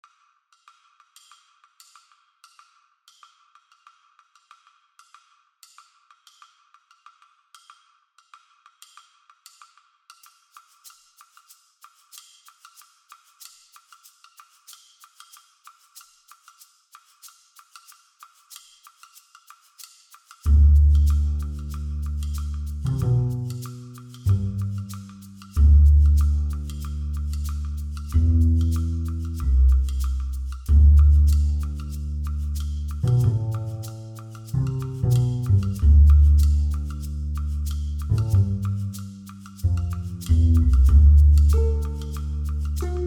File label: Forest Music Percussive